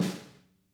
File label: SNAR, Interactive Drum Kits